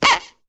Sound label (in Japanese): あひる